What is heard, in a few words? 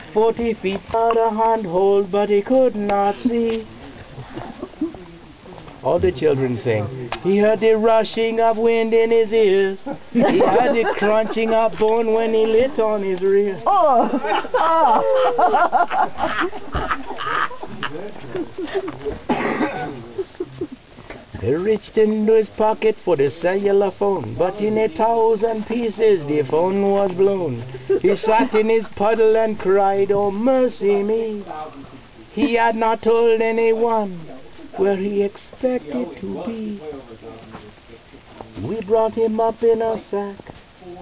reggae